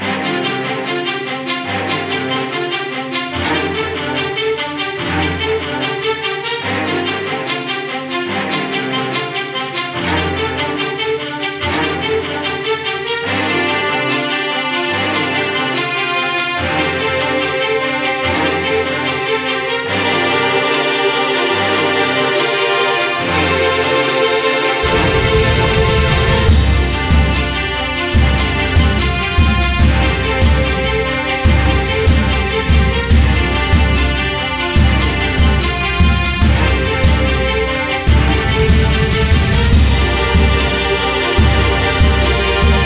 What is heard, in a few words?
Appear; Dillon's Dead-Heat Breakers; Nintendo 3DS